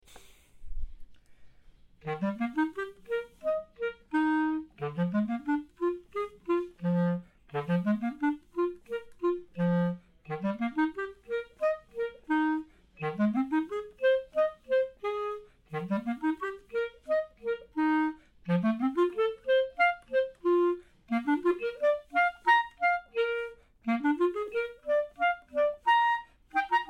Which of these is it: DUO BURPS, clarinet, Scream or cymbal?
clarinet